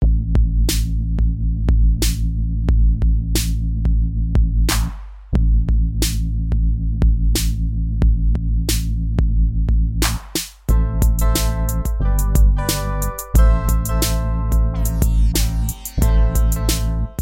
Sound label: hip-hop; Base; Aula hip-hop